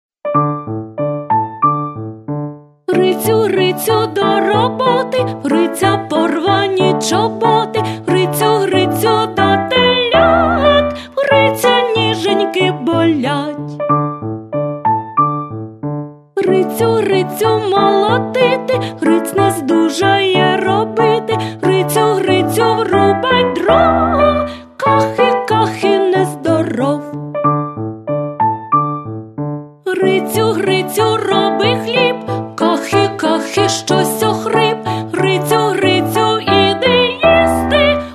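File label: ukr. narod. pisnia, Ukrainian folk song; Grytsiu, Grytsiu do roboty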